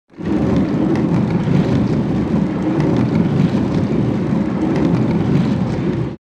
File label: Dragging a wooden object across the floor